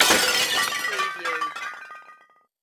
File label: CARTOON BIG CRASH